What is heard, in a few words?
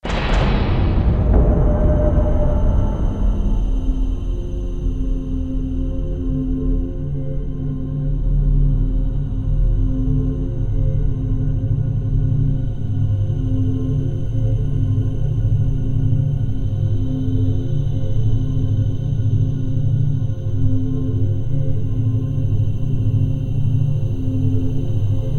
german music